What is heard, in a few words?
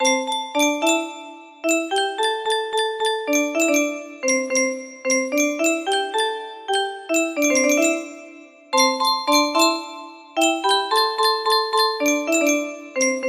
music box, music box melody